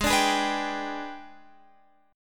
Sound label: Ab Minor Major 7th Flat 5th, down, Guitar, Open F; AbmM7b5; strummed